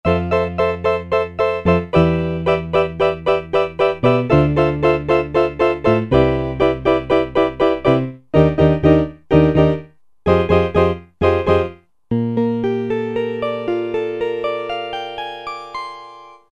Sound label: Electra Piano, Roland SR-JV80-04 Vintage Synth